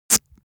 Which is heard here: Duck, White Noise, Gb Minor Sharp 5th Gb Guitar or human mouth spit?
human mouth spit